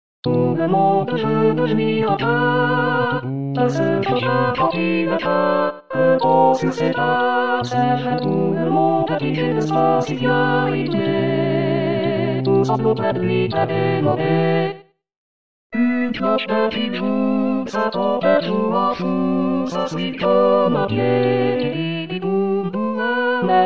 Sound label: tutti; Variété